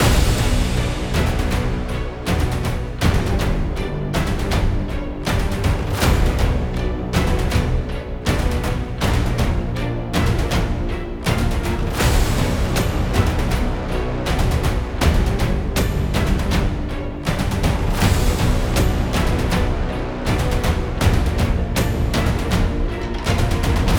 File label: Library Trailer